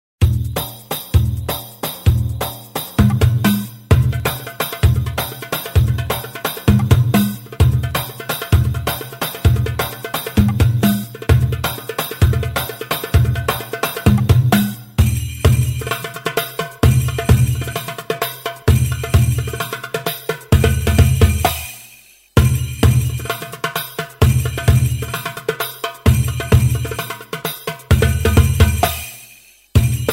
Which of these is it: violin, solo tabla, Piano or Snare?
solo tabla